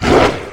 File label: Attack